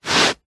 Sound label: foley footstep scuff barefoot on carpet; zapsplat